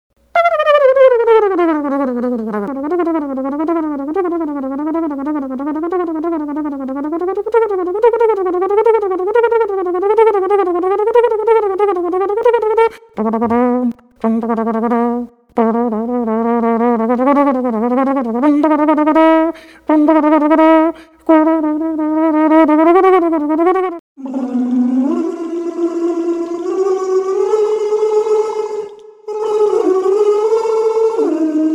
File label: Vocal Instrumentalist